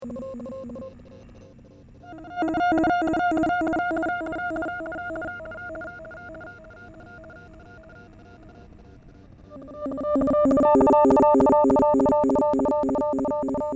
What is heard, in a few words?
square alien